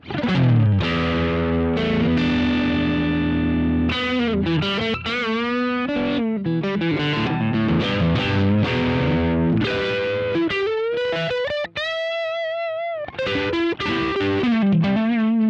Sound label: Profiling Kemper Crunch; Profiling versus Capturing